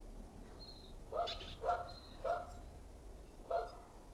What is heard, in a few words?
loudest dog